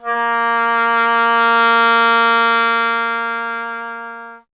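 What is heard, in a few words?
WIND OBOE; Miroslav Vitous; Solo Instruments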